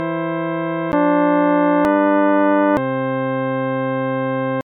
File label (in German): akkord der zweiten stufe